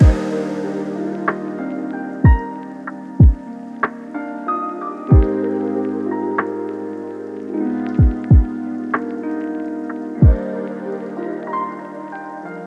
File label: Celest End Stinger, Kawaii Love Lofi Music; Kawaii Love Lofi Music Pack 2